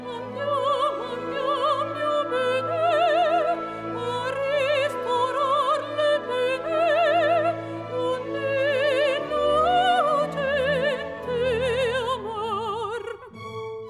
opera fem

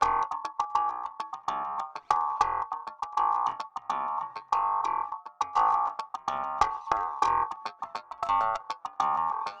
Electric Slap ringmod

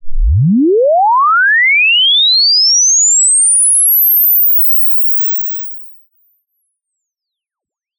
Swept float, testsignals; decimation; infinitewave

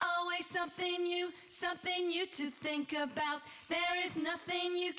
music 16kHz

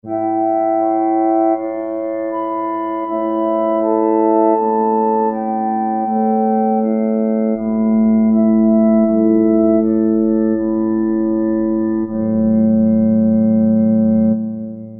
Church Organ Comp robot

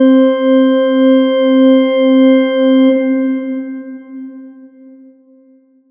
organ

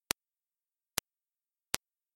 3 clicks

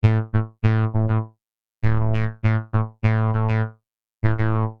Classic Funk Synth robot

